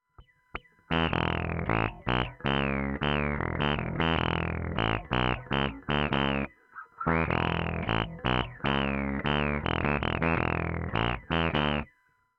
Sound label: wahoo bass ball